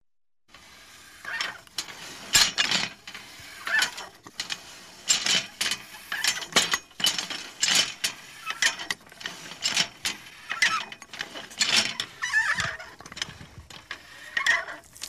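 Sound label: old hand pump pumping water, creak of the mechanism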